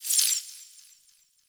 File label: Interface Hover; Warframe; Tau; Somatic Bearer